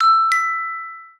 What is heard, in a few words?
ping